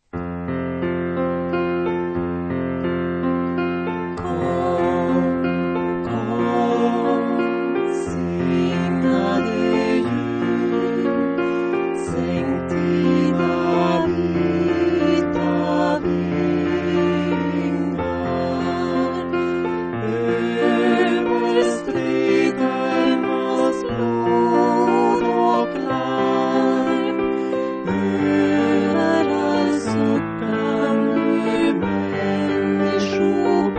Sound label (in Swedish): Jul Jul tenor